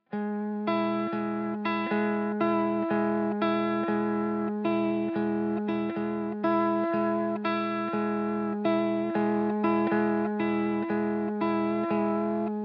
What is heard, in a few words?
120 BPM E Maj Guitar Harmonies; Cymatics; Miami Guitar Pack - Pt 2; Losing